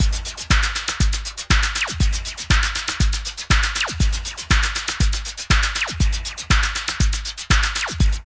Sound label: LOOP, Tekno Industrial; East West